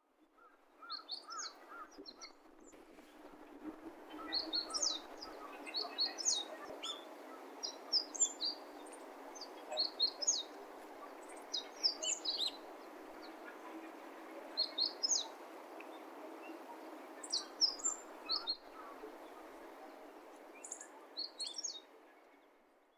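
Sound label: hojiro, meadow bunting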